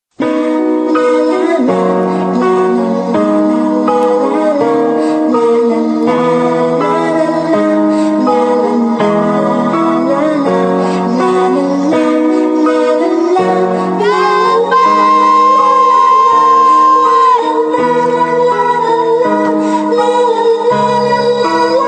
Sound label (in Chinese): qing qing de nv sheng heng chang